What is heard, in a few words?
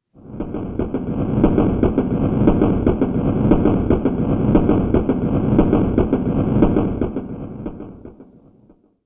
train tracks